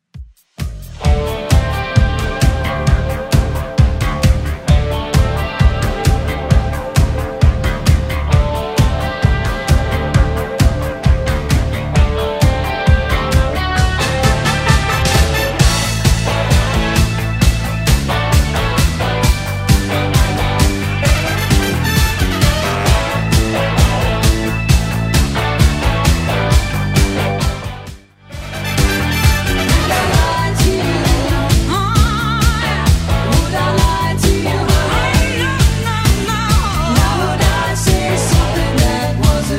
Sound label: 80s redrum 132 bpm